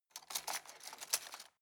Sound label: cutlery plastic drawer rattle